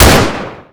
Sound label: ump45, weapons; gmod; usc